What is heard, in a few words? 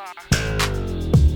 Intro Bit